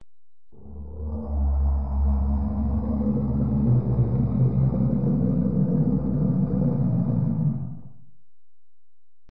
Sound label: Sci Fi Creature; audio hero